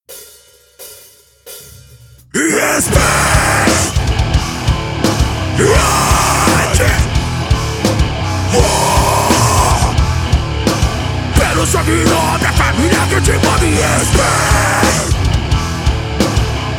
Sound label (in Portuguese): ao vivo no estudio